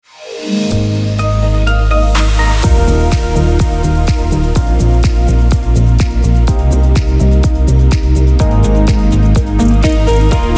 Theme Summer

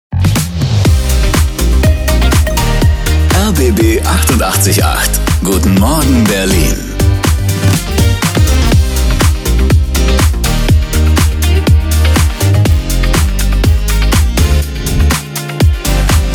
Opener Guten Morgen Berlin

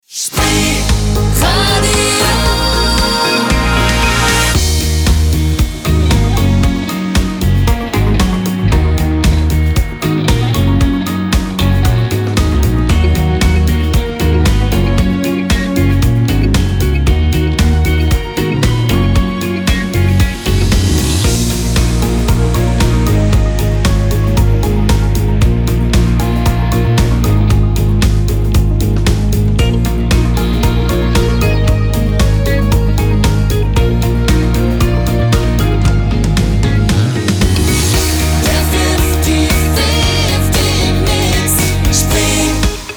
Daytime Opener; Spreeradio

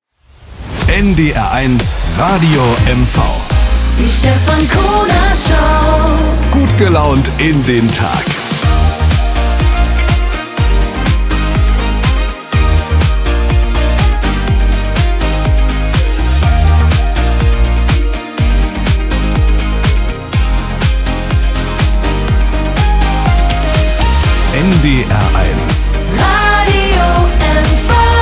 Morning Opener; NDR 1 Radio MV

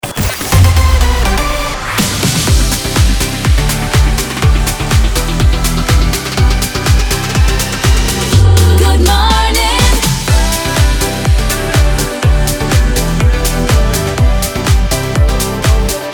Morning Opener; jinglepacks

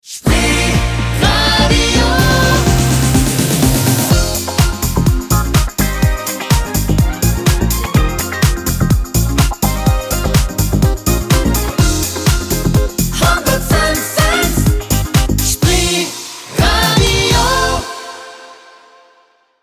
Morning Opener; Spreeradio

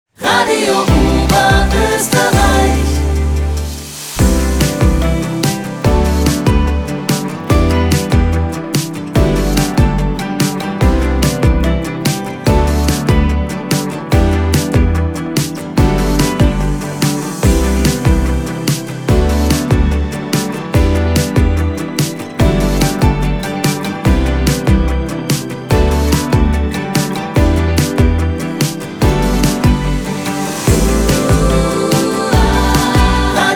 Event Theme; Radio Oberoesterreich

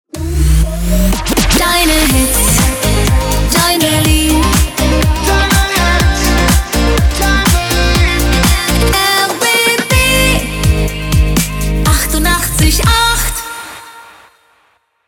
Transition Full

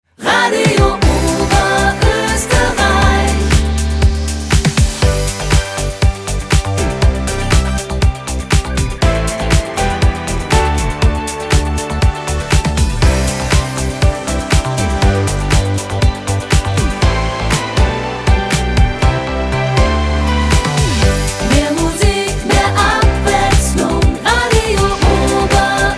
Daytime Opener; Radio Oberoesterreich

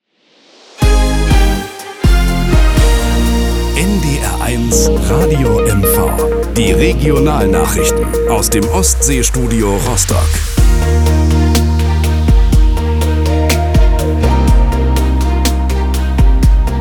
Regional News; NDR 1 Radio MV

